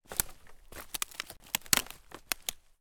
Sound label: foley footsteps twigs small branches forest floor